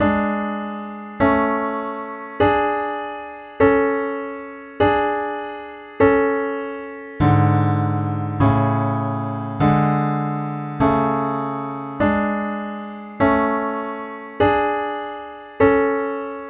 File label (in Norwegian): tonefall a dur shell akkorder